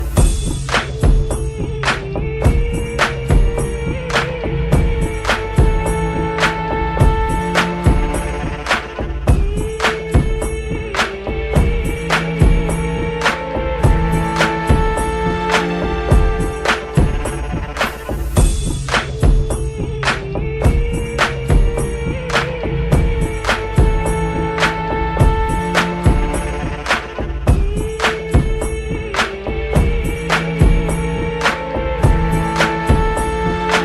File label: egyptian remix